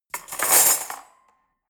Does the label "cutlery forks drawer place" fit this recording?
Yes